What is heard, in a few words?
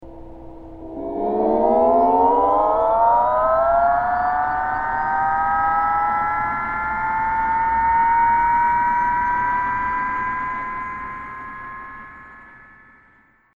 EV sound